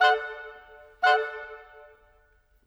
Oboes; Sonic Foundry (Sony Creative Software); Orchestral VOL-2; Modern 07

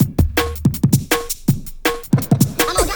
breaks 162

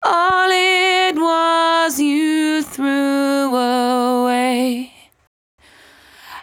74-5bpm B, Velvet Vocal; While U Could; Zero-G

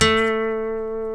B, funk guitars

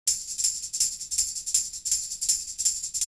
Live Perc Riffs; Jungle Frenzy 1

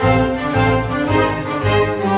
Strings; Sonic Foundry (Sony Creative Software); Orchestral Series VOL-4; Rock and Pop; Rock-Pop 13

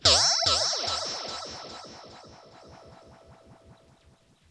RIPPLES R, Funk Guitar; 90 s Sample CDs; Zero G

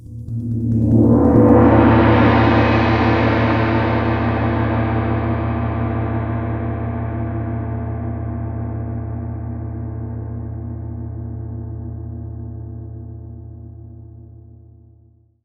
mixed gongs; 90 s Sample CDs

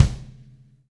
V-Pop Kick; Roland BK-5

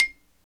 XYLO, Afro Xylophn

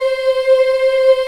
C4 CHI IH, CHILD, Choir; CHILD IHP

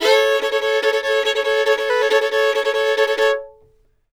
FIDDLE; FIDDLE LP140; Sampleheads; Whole Lotta Country 1 & 2

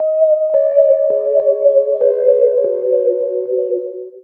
Wobulate 160 G, Keyboards, Drum&Bass; Total Drum&Bass

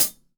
DW Red Maple Hi-Hat Closed Stereo; Sonic Foundry (Sony Creative Software); Vital Drums

